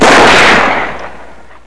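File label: revolver; gunslinger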